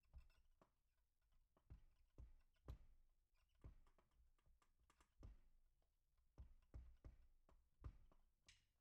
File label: BD Out